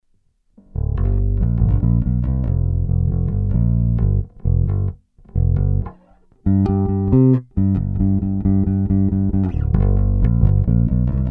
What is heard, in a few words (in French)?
basse manche; prise directe; basse bleue